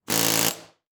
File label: Industry Tool Air Hammer Run Short